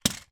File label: leisure game pick up sticks in box down on carpet